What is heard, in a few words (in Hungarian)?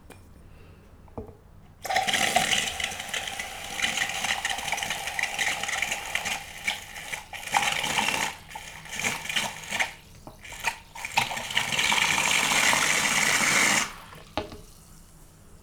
akadozo toltes, szodaviz